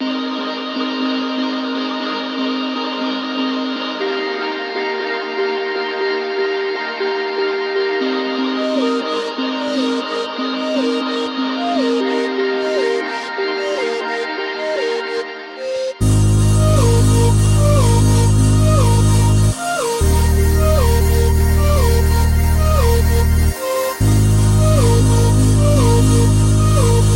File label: 120bpm D maj